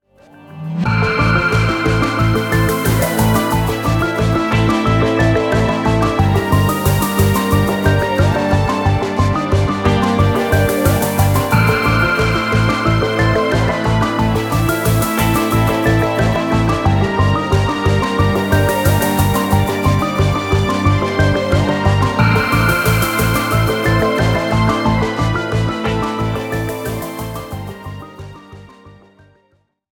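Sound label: background music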